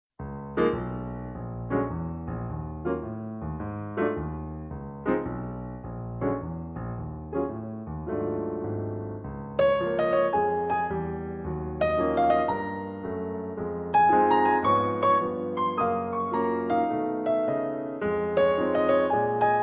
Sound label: piano